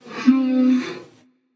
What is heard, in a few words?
thinking passion; chaac